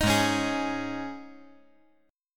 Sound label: Ab Suspended 4th